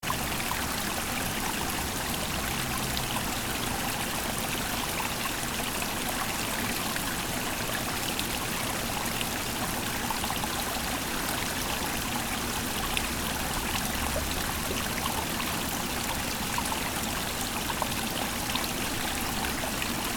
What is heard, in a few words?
water creek sound